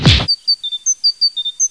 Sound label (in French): crash ennemi